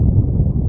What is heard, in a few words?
asteroid, VIDEO GAMES